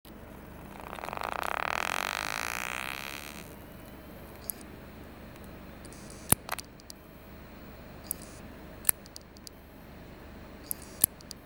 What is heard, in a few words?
Heads WD 2.5 500 GB, damaged hard drive sounds